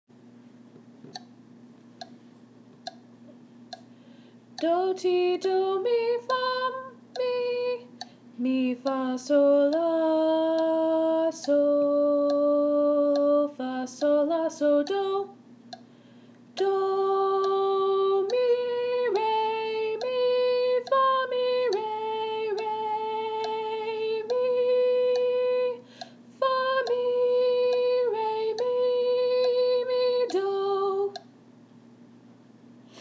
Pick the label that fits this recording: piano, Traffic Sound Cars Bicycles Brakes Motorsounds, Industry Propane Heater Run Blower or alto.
alto